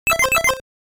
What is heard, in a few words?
Correct Password; Teenage Mutant Ninja Turtles 3 Radical Rescue; Gameboy